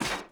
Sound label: Enemy Skillpanelsealer Move